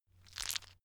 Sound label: stone gravel clatter soft; natur-tiere; steine